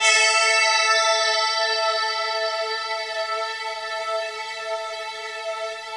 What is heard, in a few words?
Dream Pad 5th; Keys; Pads; Drum & Bass